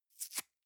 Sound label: box small tear apart soft